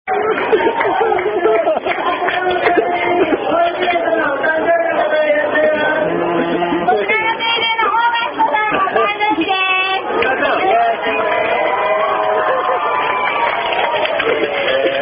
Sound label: Happy Birthday (Standard) Sung By Wait Staff In Japan; My 24th Birthday; Outback